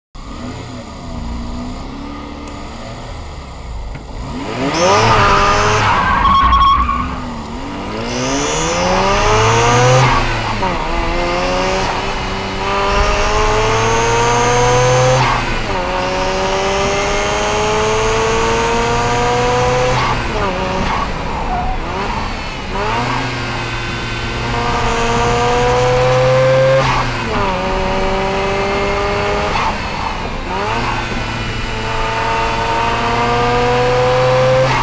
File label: Boost Sound